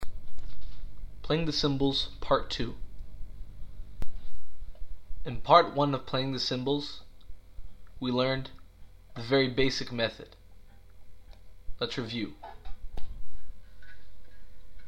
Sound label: Playing the Cymbals